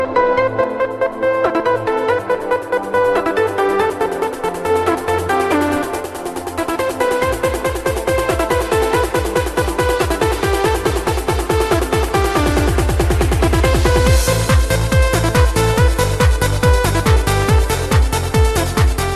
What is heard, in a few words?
Ascending